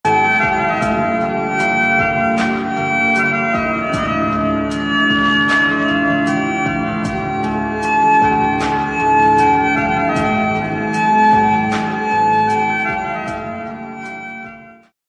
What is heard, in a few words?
emotional background music, arabic sad song turkish song ringtone